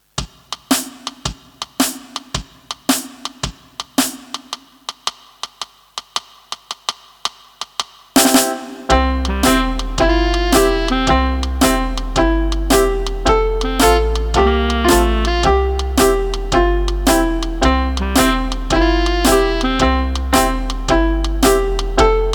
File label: Rock n Roll; Camerata i keyboard na zakonczenie roku szkolnego; koncert